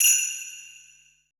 SHELLS, PERC; BPC PERC

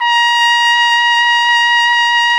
BRS, TRUMPETS; Roland - LA Composer vol. 1

